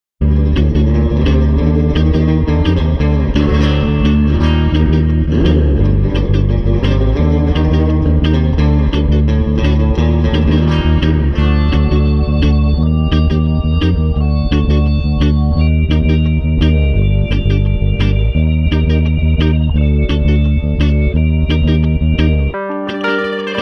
Barrel Riding No Drums 86

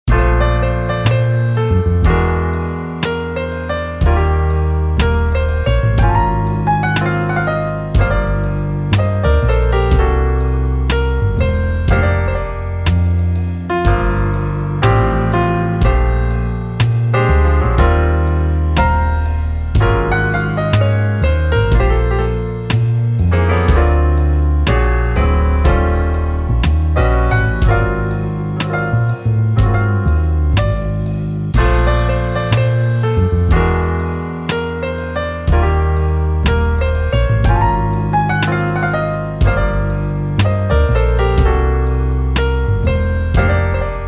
Jazz Piano Trio Relaxed 122

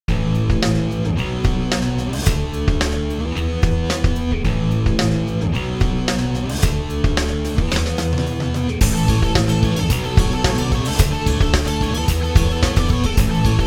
Pop Punk Instr

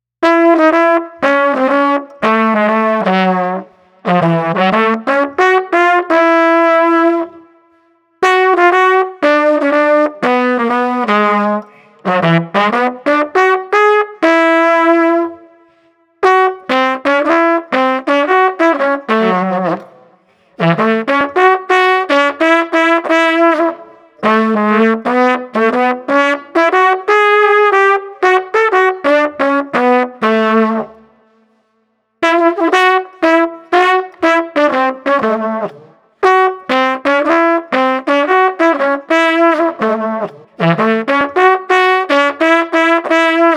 Street Trumpet Melodic 120

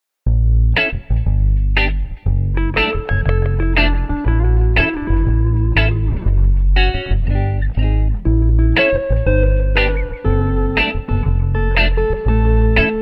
Blues Rock Smooth and Cool no Drums 117

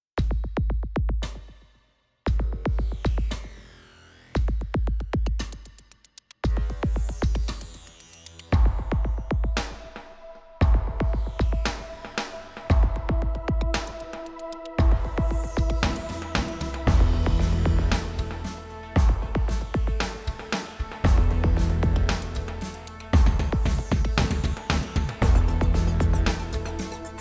Menacing Underscore 115

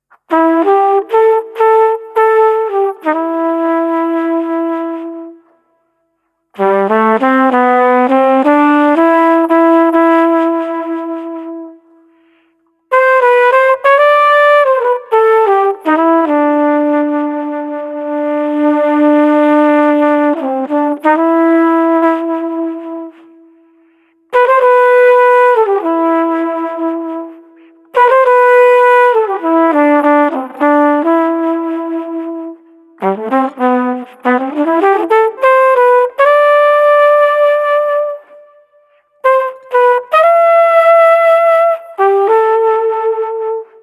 Street Flugelhorn Heavyhearted 100

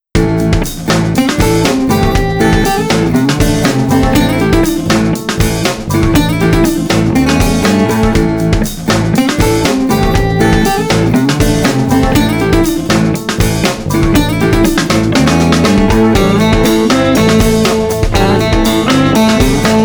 Blues Rock Excited Instr 120

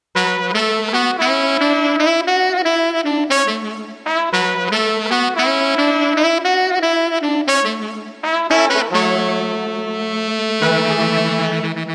Street Brass Combo Hopeful no Drums 115

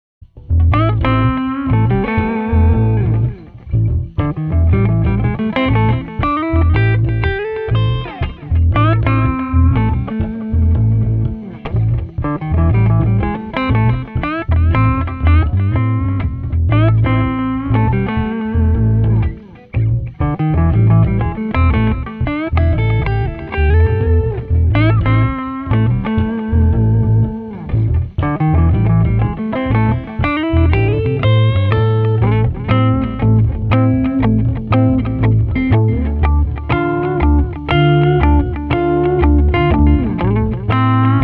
Guitar Combo Funky Drive no Drums 120

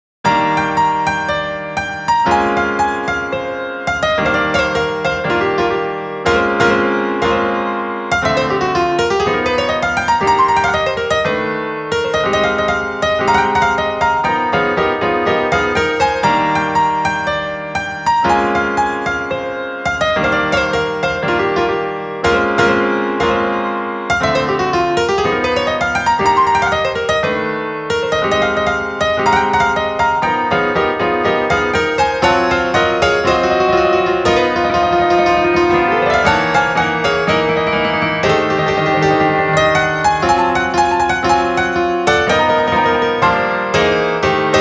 Piano Bar Suite

